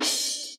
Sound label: Closed Hats